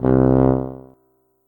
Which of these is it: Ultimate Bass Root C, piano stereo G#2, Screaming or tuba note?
tuba note